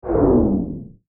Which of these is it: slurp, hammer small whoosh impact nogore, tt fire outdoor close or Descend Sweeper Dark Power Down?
Descend Sweeper Dark Power Down